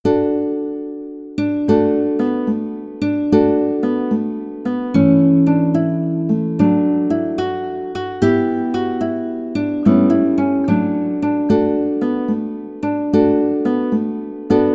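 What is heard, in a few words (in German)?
Advent Advent git, Ukulele Akkorde Melodie; Advent Advent ein Lichtlein brennt; Ukulele G–C–E–A Tuning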